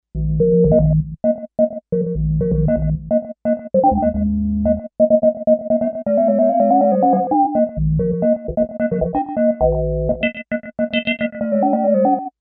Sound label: Rechner, Kawai K5000S factory presets